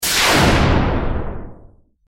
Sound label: laser zap sound